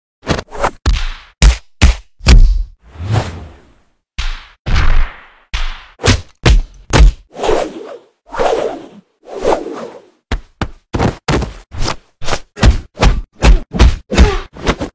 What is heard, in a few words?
Karate Punch